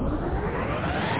jet pak on; duke3d

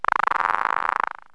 drop roll; Wired for Sound Pro CD; Aristosoft, Inc.

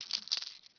coin pickup; vampire demo

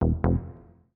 BASS SHOT HOUSE 125 A; eJay; Singleshots

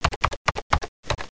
Typing

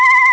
micro

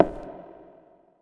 foot glass; Earth and Beyond; EA Games

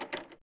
chest open; poolofradiance

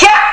kiai; Renegade; Sky Allure

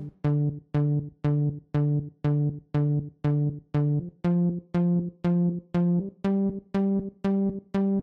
bassline; reaper

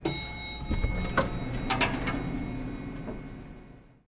ELEVATOR; zones commercial; PC Gamer IT CD 40

